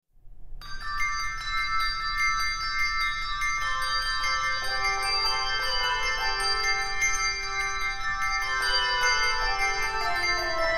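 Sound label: Handbell Music, Handbells 3-5 Octave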